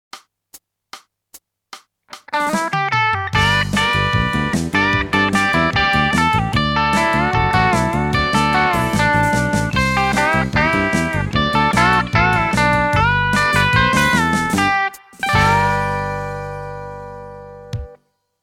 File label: country